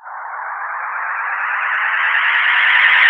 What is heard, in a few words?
Rise FX C3, Club Techno; Zero-G